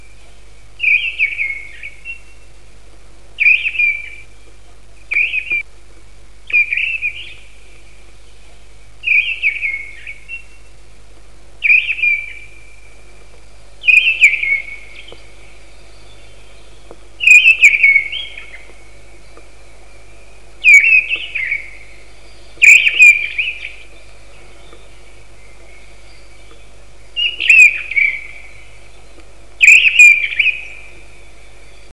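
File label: mistle thrush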